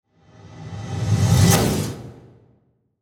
Whoosh To Hit Crescendo Debris, Distortion Whoosh Metallic Hit; Production Element Imaging Element Accent Transition; Blastwave